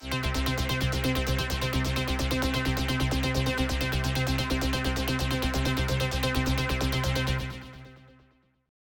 Dance Arpeggio

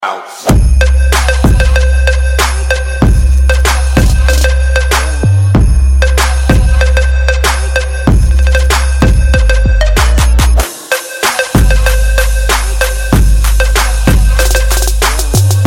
new fresh Trap

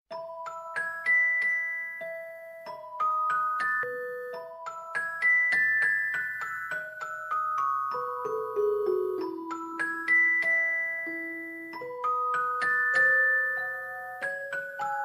music box

